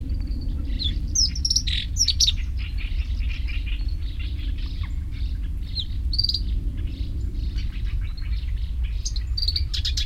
warbler black-browed reed Acrocephalus bistrigiceps